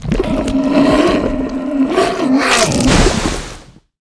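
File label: larva brown attack, monster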